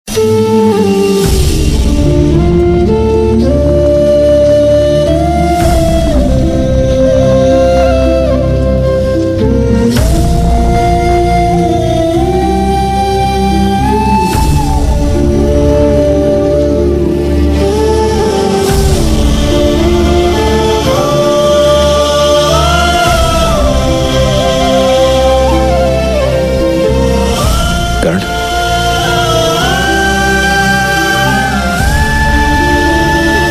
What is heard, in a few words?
Theme Music Flute Tune; Karna; TV Serial Tone; Sony; Suryaputra Karn